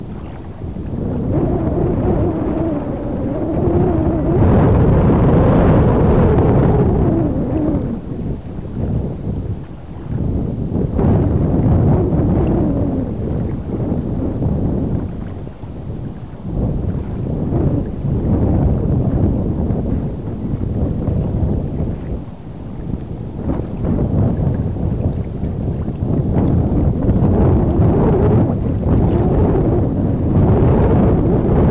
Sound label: winter wind; 51school winter